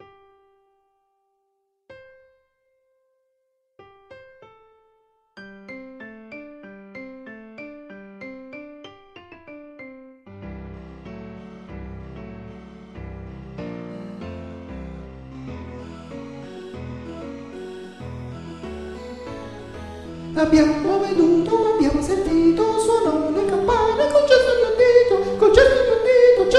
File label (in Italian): Concerto per prete e campane Soprani II